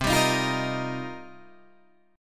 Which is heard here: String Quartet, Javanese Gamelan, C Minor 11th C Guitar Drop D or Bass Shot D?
C Minor 11th C Guitar Drop D